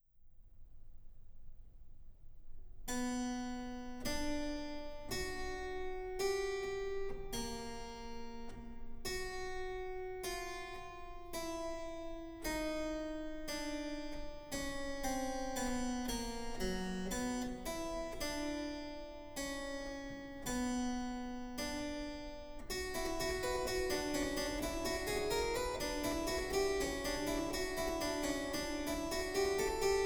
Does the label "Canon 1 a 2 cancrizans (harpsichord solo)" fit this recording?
Yes